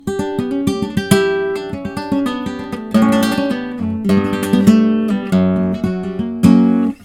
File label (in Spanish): falseta